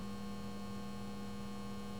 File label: light hum